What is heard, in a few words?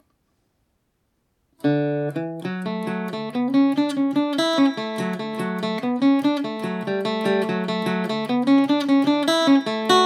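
D major at 40, irish mandolin; The Humours Of Drinagh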